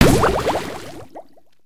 Water Charge; Buccaneer

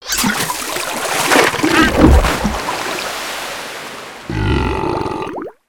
shark death; foley

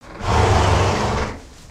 horse snort; creatures organic

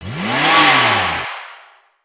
Car One shot Pitch Up

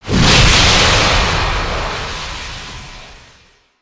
Rocket Trail; ambience

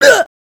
minifig damage; aronwk collection; ndaudio

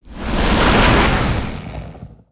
Lightning Swing; Spinjitsu

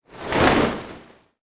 Flap Sweetener; creatures organic

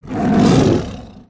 Pet Dragon Speak; creatures pets

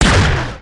cannon blast; destructibles

